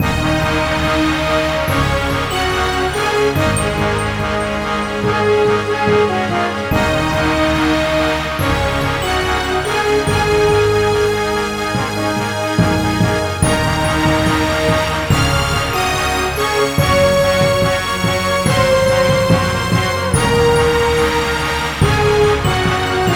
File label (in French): Maintheme Orchestre Et Timpani; Asterix Obelix XXL 2